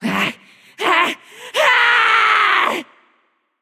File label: fear; smite; medusa